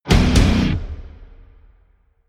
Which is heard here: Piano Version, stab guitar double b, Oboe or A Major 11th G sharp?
stab guitar double b